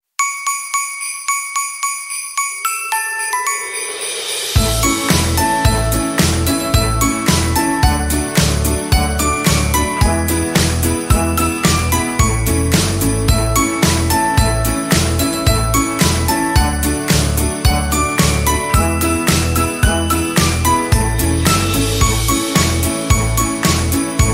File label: Jingle Bells Instrumental